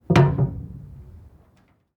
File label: metal hatch